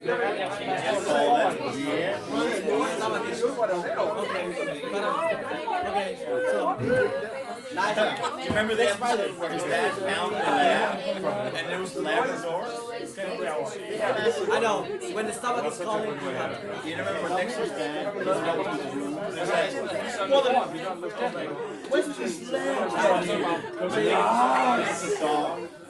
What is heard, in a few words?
crowd ambience bar perspective danish english accents drinking joyful casual party started; sonniss gdc